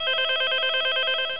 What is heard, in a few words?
ring